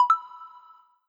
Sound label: Stereo Twinkling, notifications; Lenovo; ZUI 13